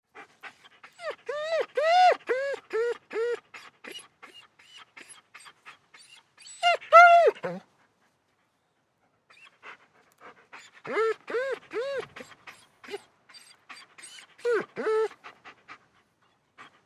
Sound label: Dog German Shepherd Pants Whines; Dogs; Animals; Big Room Sound